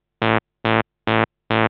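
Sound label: SYNTH BASS DANCE 140 A; eJay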